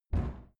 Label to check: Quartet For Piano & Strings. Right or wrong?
Wrong